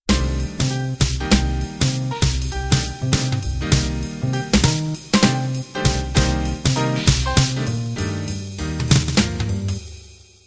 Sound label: bop intro